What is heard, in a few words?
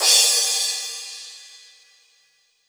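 cymbal, Drum & Bass 160 BPM; Ueberschall; Drum & Bass Flash Trackz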